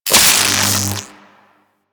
Electricity Hit Effect; Warframe

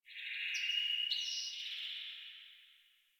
Tree Distant Critters; Ambience; Props; Warframe; Duviri

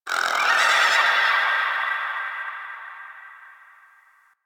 Venus Landscape Cave Creature; Ambience; Warframe; Venus Open World

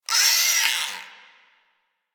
Exterior Forest Critter

